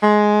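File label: soprano sax hard G#; Northstar - New Gold VOL-1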